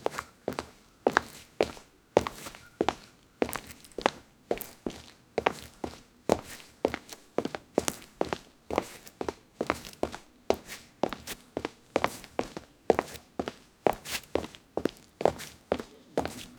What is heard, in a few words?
footsteps around the apartment